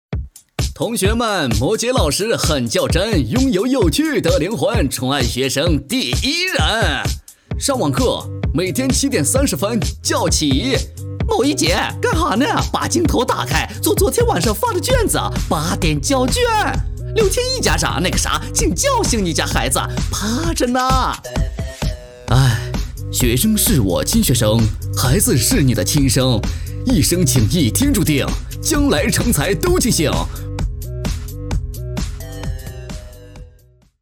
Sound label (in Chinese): 有节奏说唱; 上网课